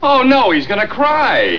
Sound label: cry